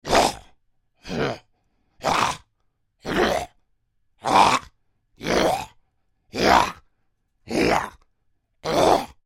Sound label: Creature Troll Grunts Short Series; Monsters; Horror; Big Room Sound